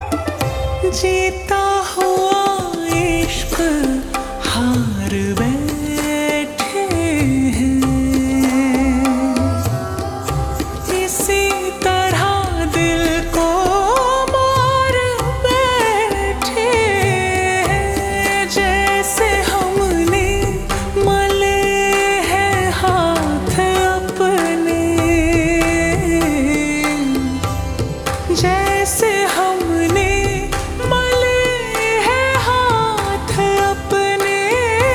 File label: Slowed Reverb